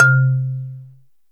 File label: Marimba; Heart of Africa